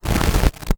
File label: Glitchy